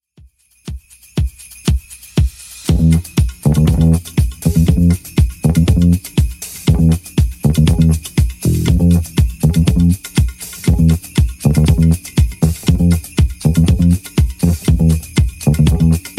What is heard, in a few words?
70s Xtend 120bpm